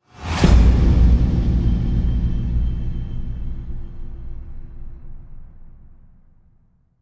booms thunderhammer with whoosh

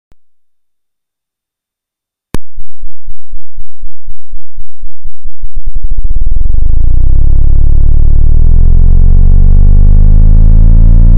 infrasound; nasa space sound recordings

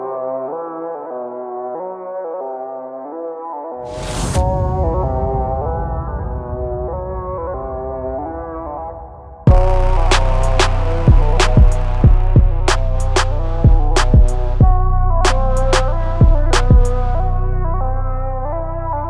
instrumental

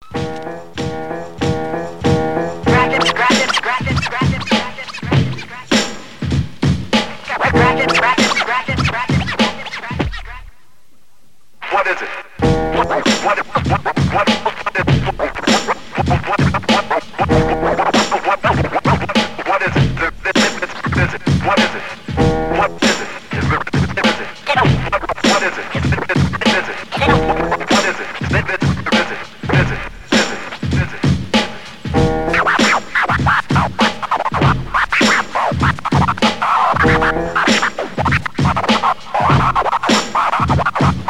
scratch break

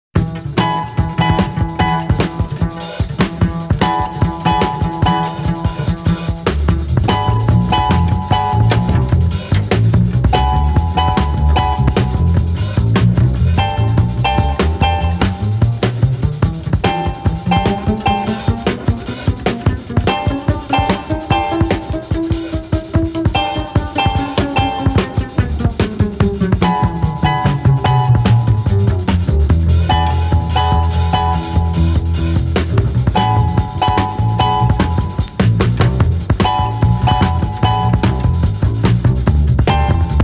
harp beat

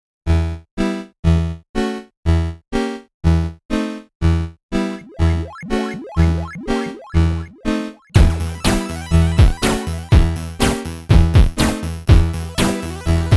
8bit

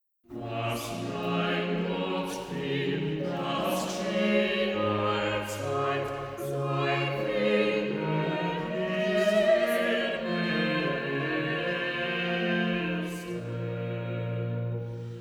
chorale cantata chorus, choir; was mein gott will das gscheh allzeit bwv 144

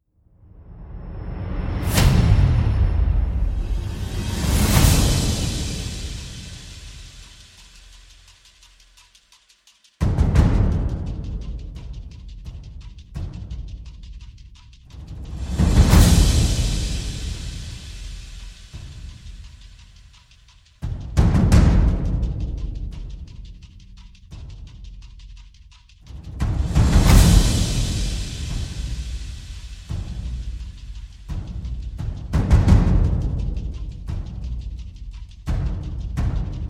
perc only

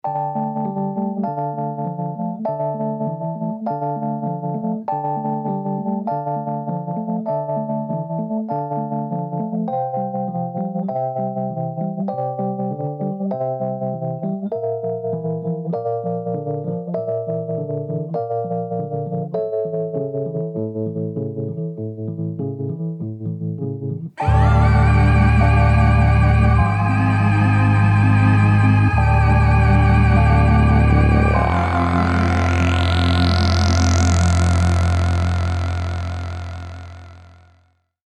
interlude instrumental

